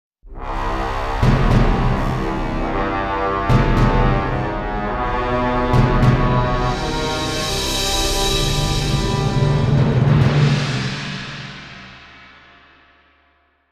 Lose Battle; heroes of might magic v gamerip 2006; Academy

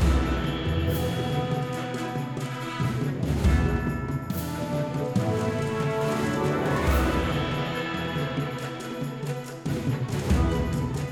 Music Village; My Little Pony Rainbow Runners; android

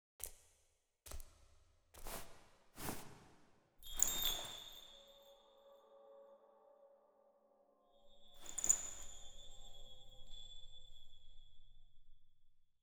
Bells; cutscene 1-3

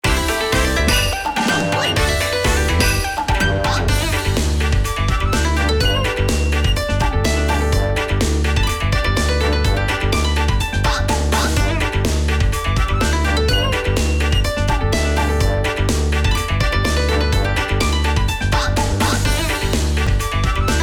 Jingle Entry; Taiko no Tatsujin Drum Session; Session de Dodon ga Don; PS4

